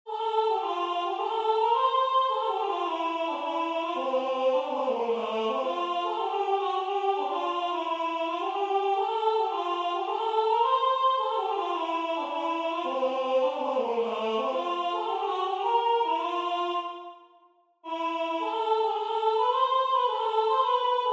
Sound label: People Sing full; Waiheke Island Choral Society